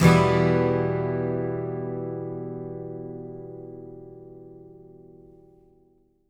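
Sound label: Acoustic guitar E minor down, Country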